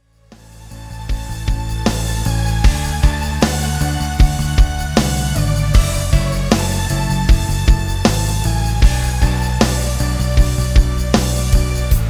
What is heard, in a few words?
rhythm